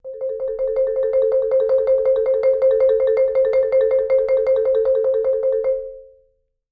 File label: C4, Marimba roll; Sampleheads - Dave Samuels Marimba & Vibes